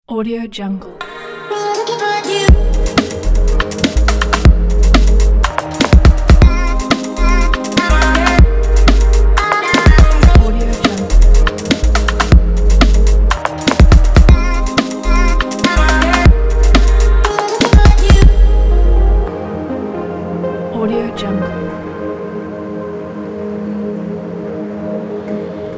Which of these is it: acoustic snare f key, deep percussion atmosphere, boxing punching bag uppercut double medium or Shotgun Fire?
deep percussion atmosphere